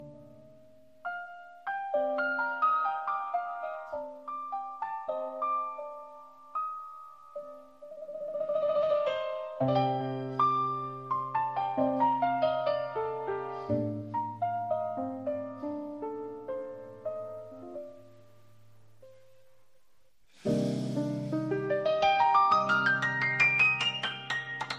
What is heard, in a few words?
ambient